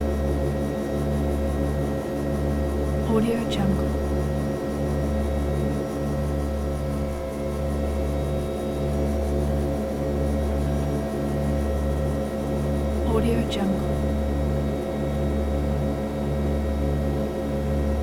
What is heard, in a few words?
c2a greyhound cod turbo prop plane interior; prosoundfx